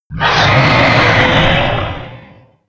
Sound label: die; doom3; hellknight